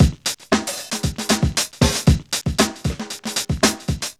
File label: MAD BEAT 116, Funky Elements loops; Zero-G